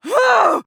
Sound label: Grunt Physical; Mage; Kunoichi; Realm Royale